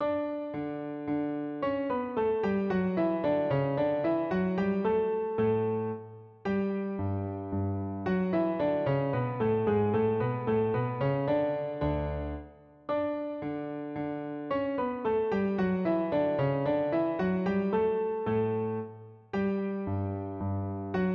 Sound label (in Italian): Danza in re piano